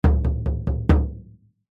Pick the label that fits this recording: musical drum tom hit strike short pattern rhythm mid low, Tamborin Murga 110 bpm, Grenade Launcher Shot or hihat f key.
musical drum tom hit strike short pattern rhythm mid low